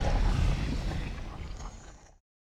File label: Lava Hit